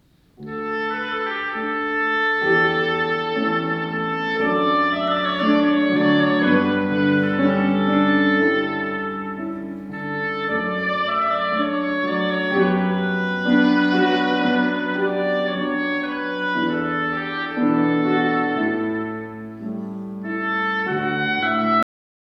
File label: Oboe